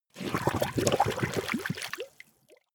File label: liquid slurp large